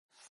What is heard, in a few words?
window appear